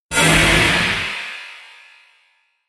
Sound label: Metal Explosive Impact; Miscellaneous Impact Sound Effects